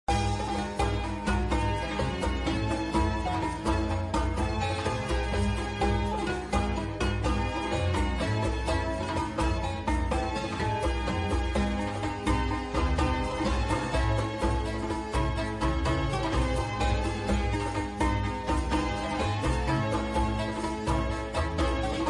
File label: music loop; casino; corgipharaoh